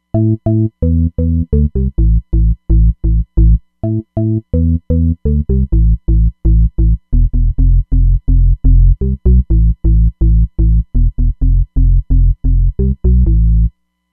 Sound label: FS GarageBs2, Roland Fantom XR